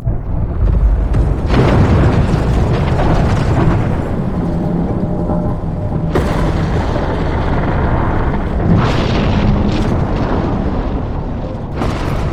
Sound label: periph bridge brea; LoL; emitters map12